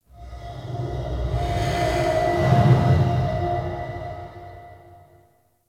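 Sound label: Large Cargo Room Distant Ambience; Warframe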